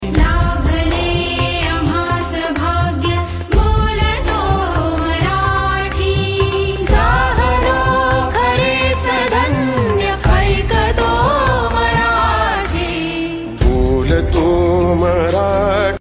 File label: Marathi Ringtone